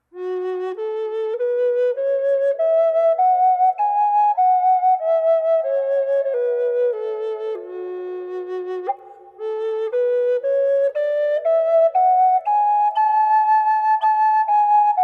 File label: paduak fsharp wet, flutes; standard flutes